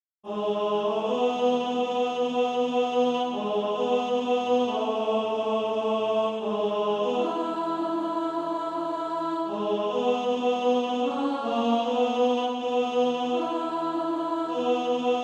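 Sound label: tenor, Practise Music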